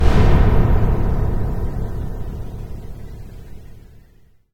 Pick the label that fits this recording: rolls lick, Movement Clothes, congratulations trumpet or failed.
failed